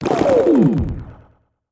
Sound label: Potion drink